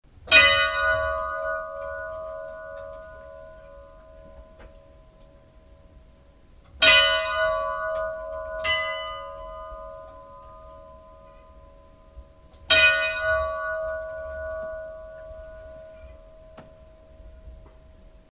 hvalsneskirkja badar, kirkjuklukkur

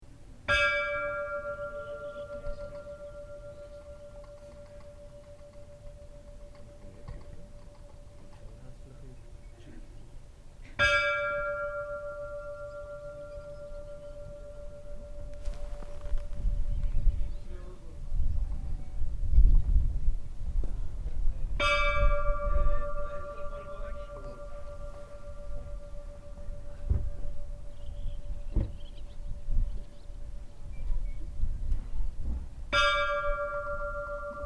budakirkja likhringing, kirkjuklukkur

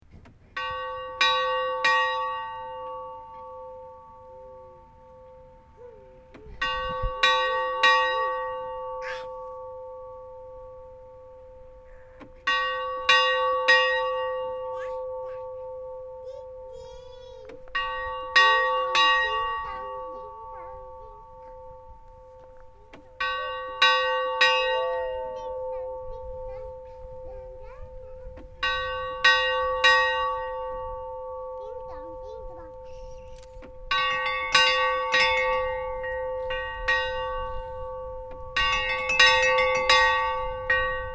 gufudalskirkja badar, kirkjuklukkur

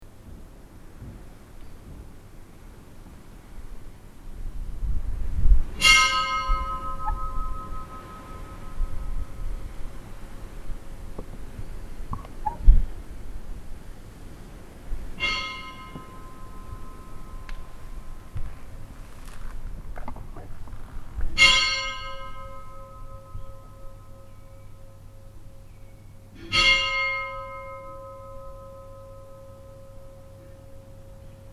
narfeyrarkirkja likhringing, kirkjuklukkur

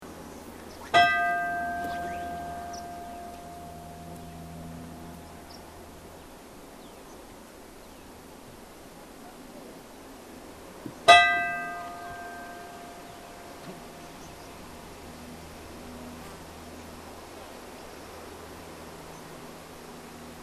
ulfljotsvatnskirkja likhringing, kirkjuklukkur

